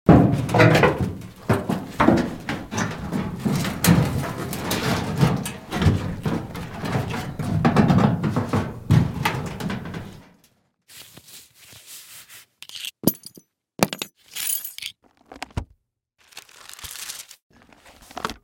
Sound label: Clothes and Books; Blastwave FX